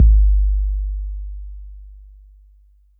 Roland 808 BD

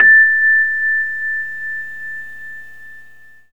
Rhodes A; 3D Sound; 3D Keys VOL-1

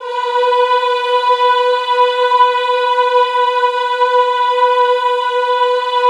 EHH B3, Classical Choir; Best Service; Extended Classical Choir; EHH MIX

